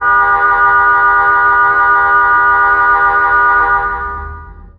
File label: horn, trainhorn; GTW